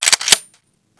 slide back, glock22; weapons

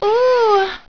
nymph

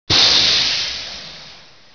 steam burst; co niveus

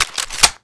saw coveropen; svencoop

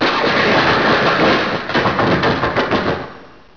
charge; svencoop

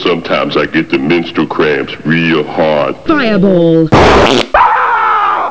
fire fart; svencoop; bluecell

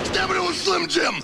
snap into; svencoop; ragemap3

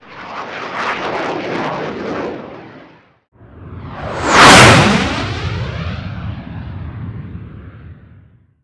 flyby; firearms

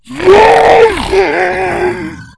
zombie attack

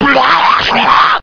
zombie scream; zombierpg

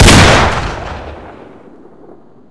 357 shot, weapons; svencoop

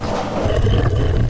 push box; gearbox; debris

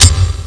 he bounce, weapons; svencoop

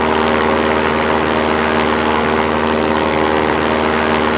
helico; svencoop; sa13

